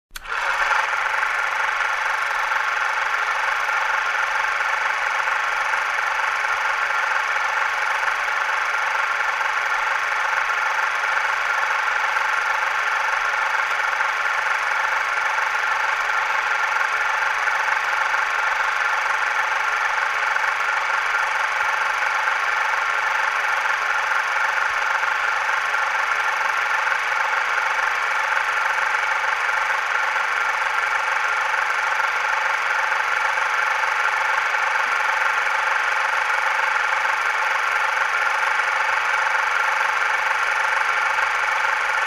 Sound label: Camera Projector Rolling